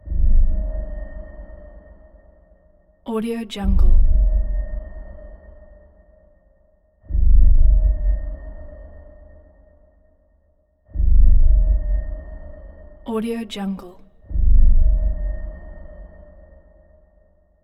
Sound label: deep ambient bass; sinematic 2; soundmorph